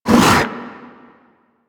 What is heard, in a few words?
Barrier Cast; Warframe; Warframes; Brawler